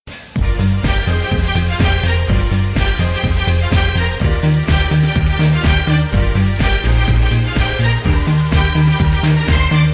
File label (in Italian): sigla; uomini e donne